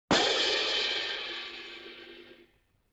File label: LoFi Cymbal Crash; Vintage Cartoon; Drums & Percussion